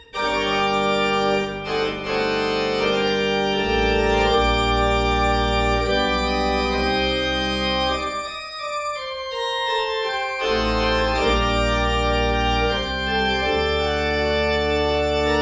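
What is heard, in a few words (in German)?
Orgel, pfeifenorgeln; Kreienbrink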